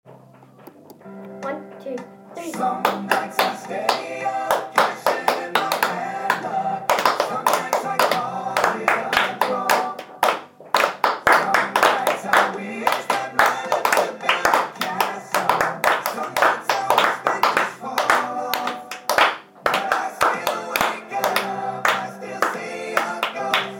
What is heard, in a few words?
clapping piece with backing